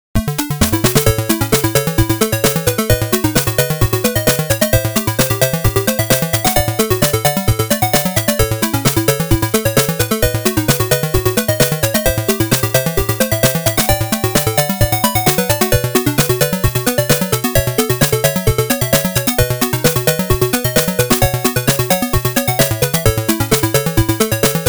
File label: 8bit result